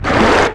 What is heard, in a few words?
dragon attack; md pri dragon 2